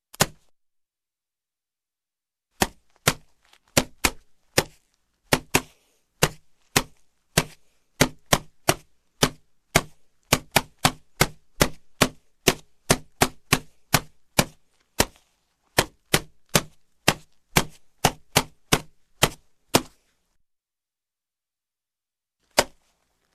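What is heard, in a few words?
Punching a boxing bag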